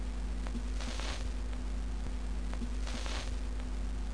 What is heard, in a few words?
Needle